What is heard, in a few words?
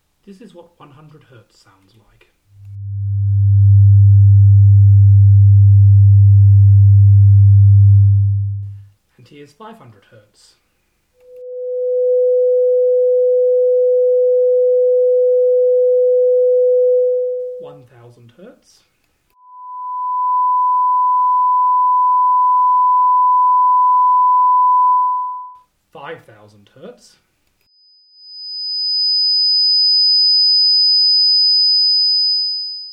frequency range